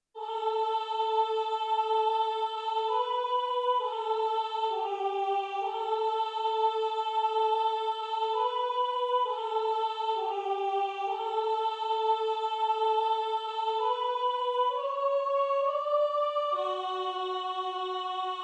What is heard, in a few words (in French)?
conquete de Paradis soprano; Chants